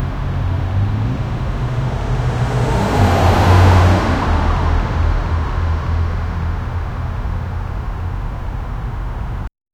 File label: sounds of a 1950s chicago, neighborhood